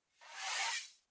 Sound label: Sword Slide Swish